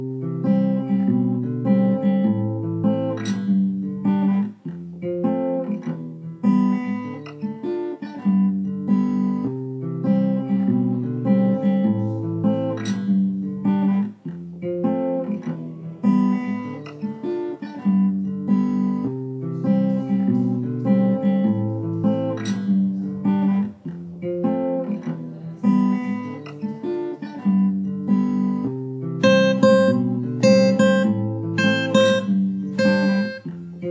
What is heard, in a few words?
Instrumental; Rhythm Doctor OST